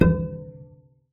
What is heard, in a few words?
Cello D Bass